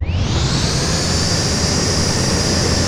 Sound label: legpower, implants; Dystopia